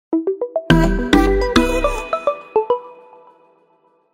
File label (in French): LOGO version epuree; Evole Energies